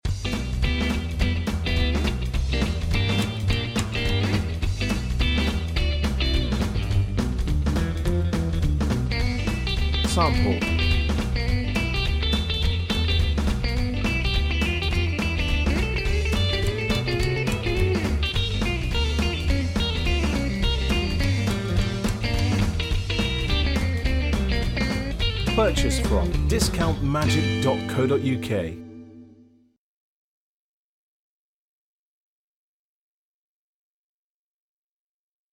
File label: beat